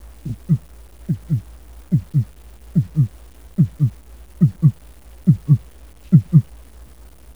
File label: HEART